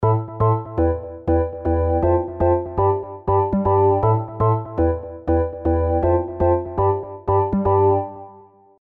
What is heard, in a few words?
EZkeys 2 Electronic Pop House Bell Key; EKX House 4